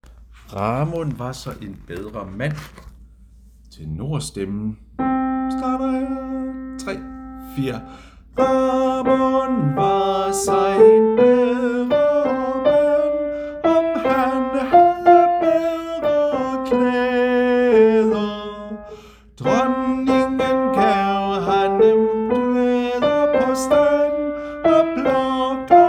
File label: Tenor